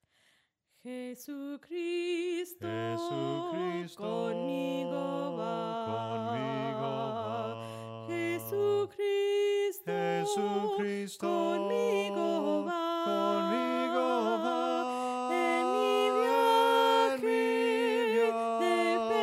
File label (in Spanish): SOPRANO CANTADO, himnos en melodia soprano